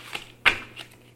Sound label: playing cards